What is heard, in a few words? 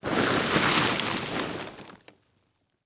Tent Unfolded Moving On Dirt; Sports